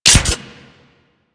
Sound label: Microwave Pistol Mech; Grineer